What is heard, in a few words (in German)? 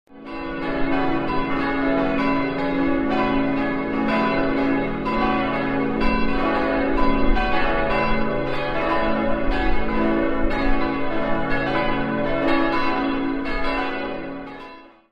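Glockengeläut; St. Vitus; Bad-Salzschlirf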